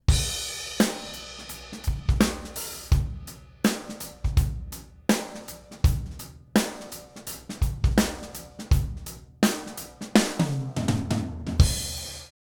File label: drum dry